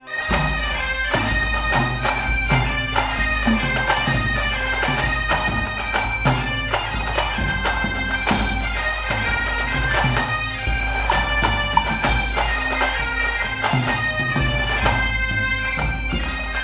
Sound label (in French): Marche gallo-vannetaise